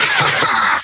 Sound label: Vocal Adlib Male Laugh Manic